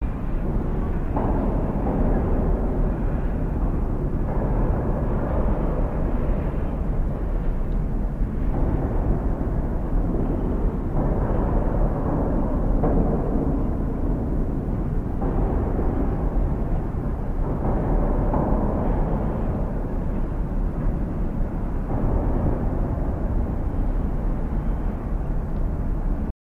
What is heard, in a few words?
Train Hump Yard, rail yards dist